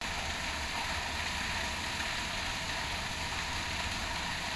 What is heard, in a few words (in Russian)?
po kanalu stekaet voda protok